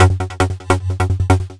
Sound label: 150 F#m, Melodic Loops; M8; Electronisounds